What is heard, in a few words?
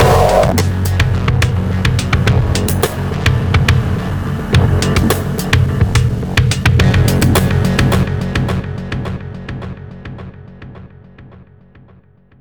Mission Music Stinger Intro; starcraft 2 wings of liberty